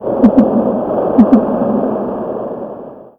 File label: Creature Button